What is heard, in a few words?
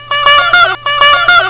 puter blap